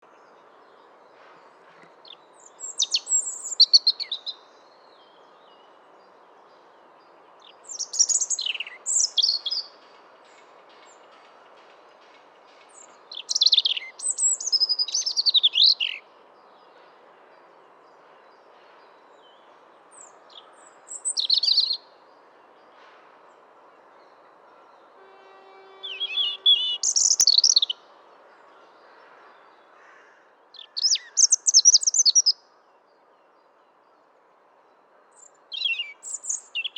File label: Erithacus rubecula; SETE